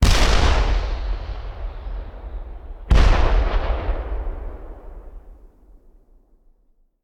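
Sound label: Artillery Rocket Launcher; Sound Ideas; Guns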